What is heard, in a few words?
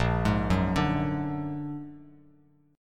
A sharp Augmented 7th A sharp Bass Standard; A#+7 arpeggiated; JGuitar